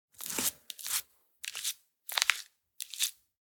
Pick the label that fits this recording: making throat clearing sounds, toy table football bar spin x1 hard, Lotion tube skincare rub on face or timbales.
Lotion tube skincare rub on face